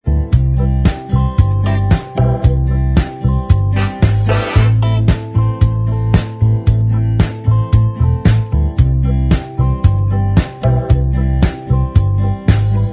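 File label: Instrumental Track, backing tracks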